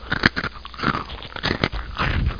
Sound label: CRUNCH; Education Publishing Concepts; NOAHDEMO